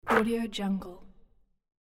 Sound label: net swoosh